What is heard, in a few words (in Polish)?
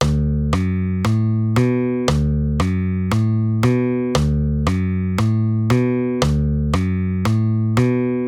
Figura tony D Fis A H